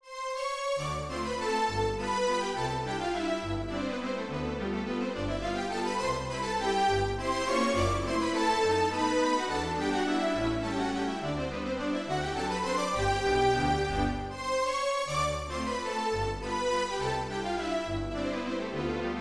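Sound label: Strings, Yamaha P-143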